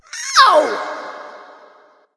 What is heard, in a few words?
ouch light; smite; voicepack; hel